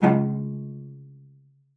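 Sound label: CELLO spiccato C#2; Xsample VOL-5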